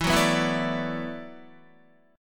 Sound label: E 7th Suspended 2nd Suspended 4th, down, Guitar; E7sus2sus4 strummed; JGuitar